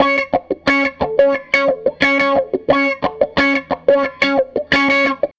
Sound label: Strat Guitar Loop